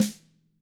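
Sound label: DW HIT, drums, snare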